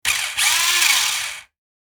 RC toy car remote controlled short rev; rc toys sound effects

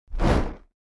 Heavy fire movement flame whooshing

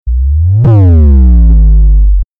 Bass drop meme; bass boosted

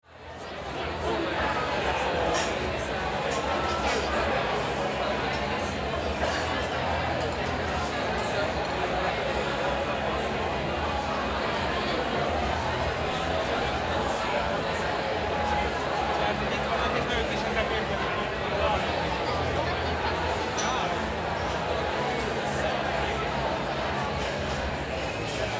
Northern India city ambience movement walla

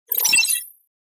Digital futuristic confirm

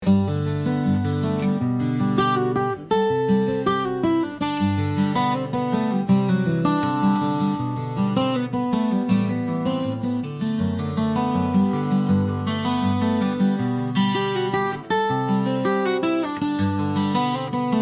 Modern indie acoustic emotional

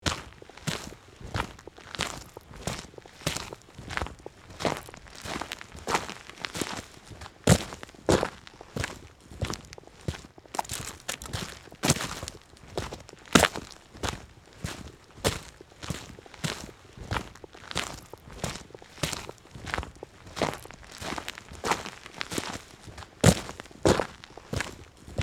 Footsteps walking on dirt heavy boots

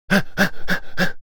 Male excitement moan in pleasure

